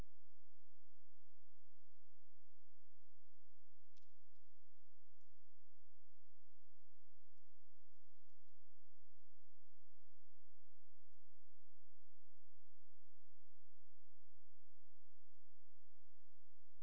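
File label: hydrophone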